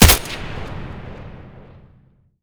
m14 fire; Escape from Tarkov

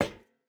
footstep rubber boots metal run; Rust

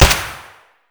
glock17 indoor close silenced; Escape from Tarkov

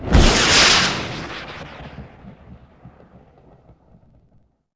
Sam Missle; Civ4 warlords

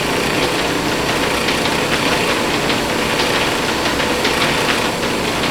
wall fan metal damaged loop

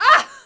Archer Hit Vox; Civ4 warlords

